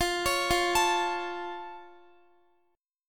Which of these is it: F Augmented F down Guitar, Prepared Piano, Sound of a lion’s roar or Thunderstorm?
F Augmented F down Guitar